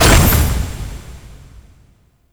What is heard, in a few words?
Standard Door Close; Ambience; Gameplay; Warframe; Entrati